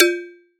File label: FX JUG BND; ModelCycles